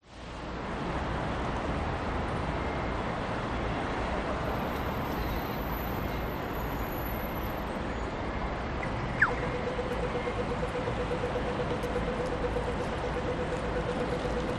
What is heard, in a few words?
public places city road wide traffic few pedestrians, Sydney; elizabeth st hyde park outside st